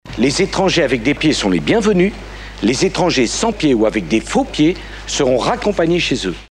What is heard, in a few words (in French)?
pieds